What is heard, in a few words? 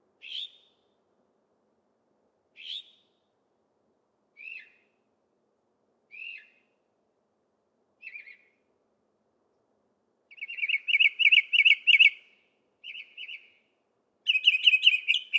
Northern Mockingbird